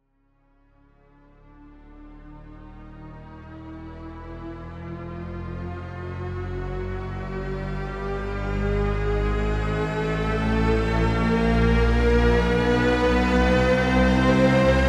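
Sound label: Spitfire Chamber Strings Shepard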